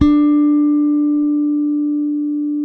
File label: bass, sustain; Sampleheads; Will Lee Bass Library